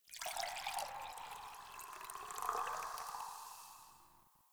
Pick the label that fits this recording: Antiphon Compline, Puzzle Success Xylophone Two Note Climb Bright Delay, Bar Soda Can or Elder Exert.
Bar Soda Can